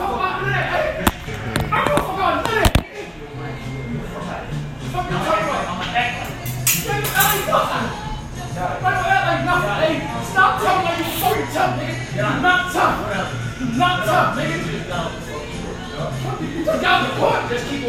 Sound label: tavern barfight; grace tavern